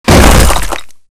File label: concrete shotgun bullet impact; titan; Titanfall 2